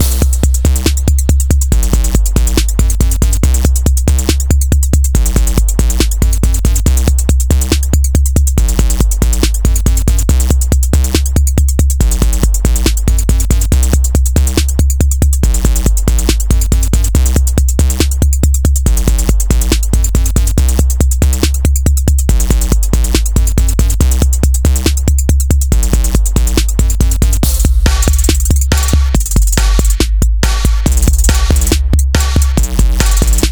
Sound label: 70bpm